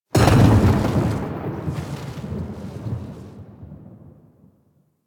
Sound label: Loop Respawn; Warframe; Caliber Chicks minigame; transitions